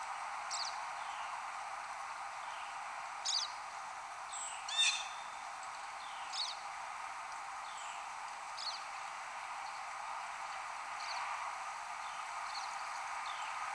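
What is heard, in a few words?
Pine Siskin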